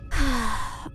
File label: deep breath